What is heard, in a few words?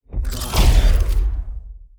2 buttons shot; TANK; 3Souls